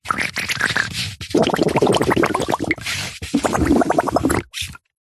foley bicycle pump in slime gunge release air hiss bubble gloop; zapsplat